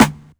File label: Damped Snare D Key